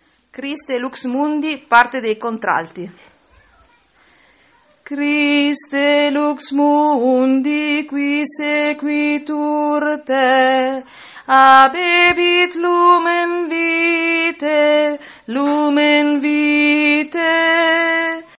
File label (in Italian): contralti